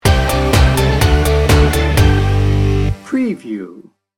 beach party sting bumper

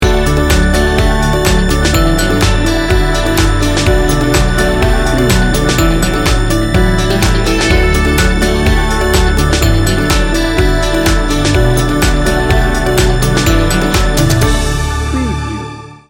corporate team building

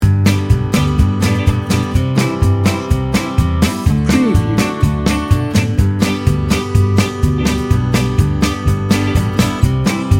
tex-mex fiesta loop

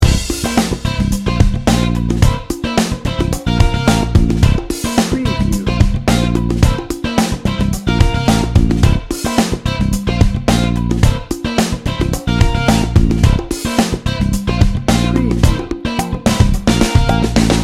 funky feet loop

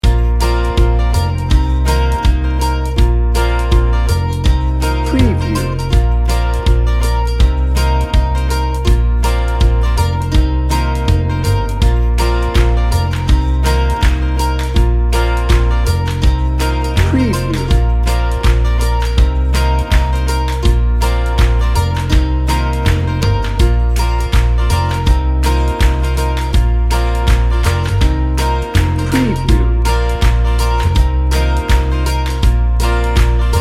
rustic heartland bed

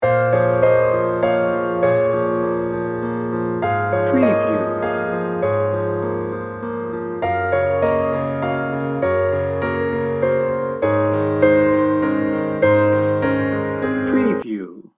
cinematic romance loop

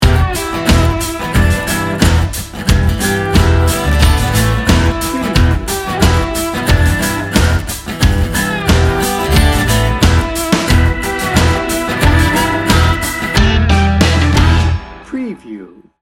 macho blues rock

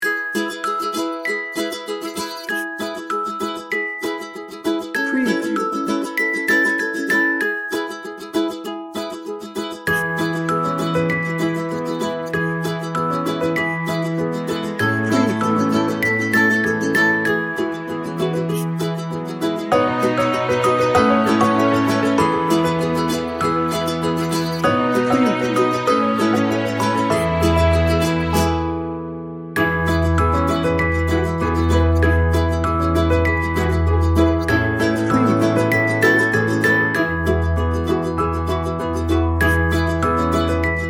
pure magic no drums

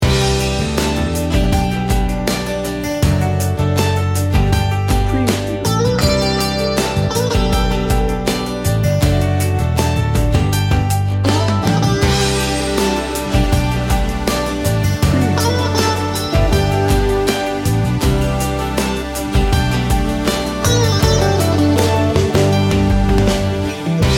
laid back and warm loop

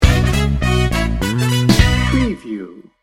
funk n brass sting bumper

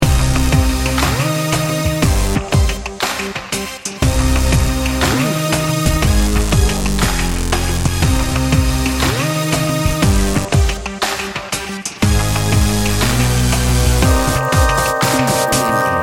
dubstep gangsta downtempo loop

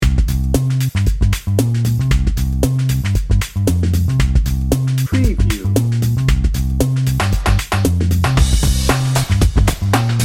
happy hip hop dnb

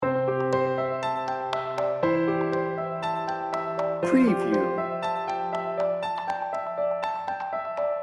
inspired dreamer loop

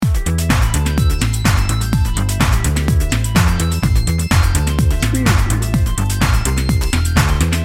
corporate technology edm loop

